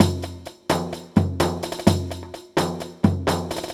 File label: Percussive Loops 128BPM; Vengeance Samples